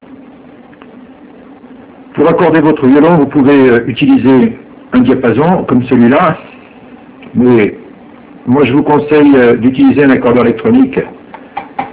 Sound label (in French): accord, violon